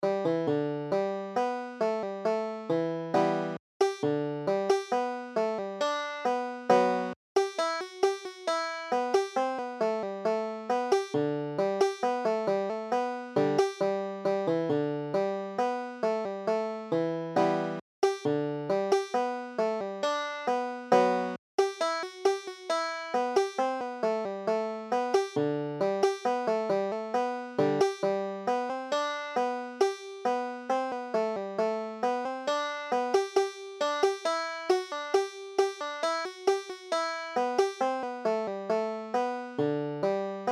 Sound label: farewell to whiskey, banjo